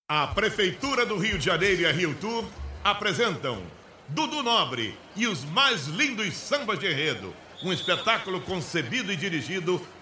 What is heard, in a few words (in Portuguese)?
Batucada, Ao Vivo